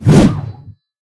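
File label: crushing swing of a heavy hammer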